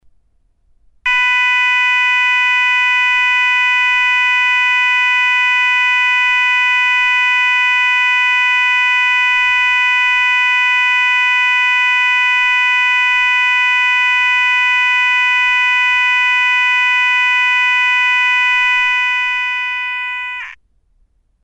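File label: 500Hz continuous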